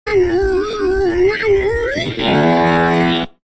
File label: brain fart 0.5x speed